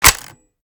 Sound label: g36c bolt; daffas arsenal; tacz